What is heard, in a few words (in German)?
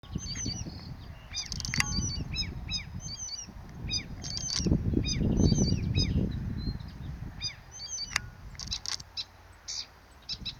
Gelbspötter im Gehölz nahe des westl. Rantumbeckens